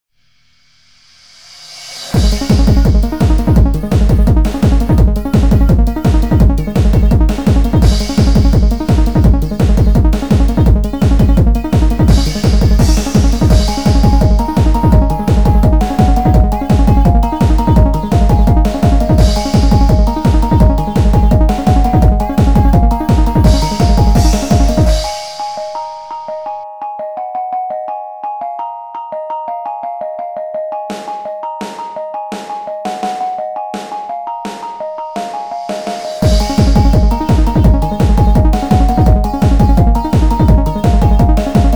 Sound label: Chill Instrumental; Friday Night Funkin' Deep Sea Date OST